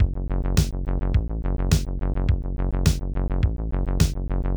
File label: bass filter duck pitch